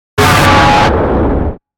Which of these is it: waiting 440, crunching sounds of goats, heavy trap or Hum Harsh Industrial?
Hum Harsh Industrial